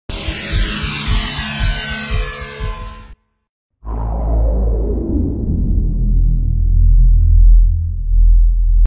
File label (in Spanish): Efecto sonido preguntas